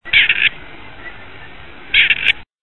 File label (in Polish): kuropat